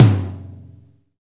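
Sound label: tom, acoustic toms; Roland L-CDX-01; Drum Kits 6; LA Kit 3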